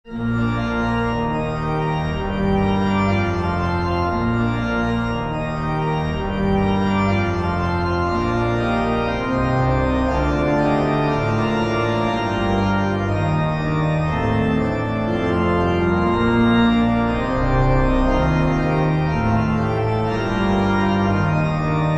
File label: Pipe Organ Cover